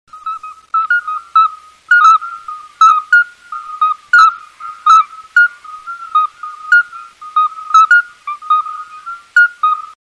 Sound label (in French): chant alytes; batraciens